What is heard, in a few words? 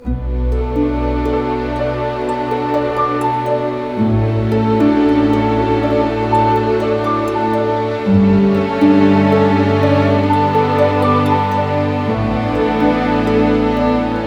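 60 sad, orchestra; East West; Scoring Tools; Sad Story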